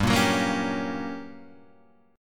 G Minor Major 7th Flat 5th, G; GmM7b5 Chord {3 1 4 3 2 3} strummed

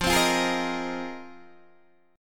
F Minor 11th, Guitar DADGAD

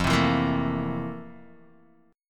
F Major 11th Guitar DADGAD

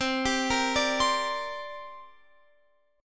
C 7th Suspended 2nd, Guitar, Open F; C7sus2 arpeggiated

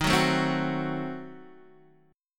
Eb Diminished 7th Eb, Guitar Open D

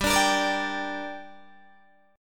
G Minor G, Guitar, DADGAD; chordshape; down

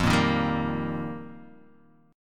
F 9th Suspended 4th, Guitar, DADGAD; 3,1,1,0,1,x

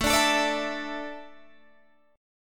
A Suspended 4th, Guitar Half Step Down

